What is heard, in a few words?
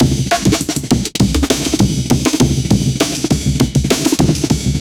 amen break, breakbeat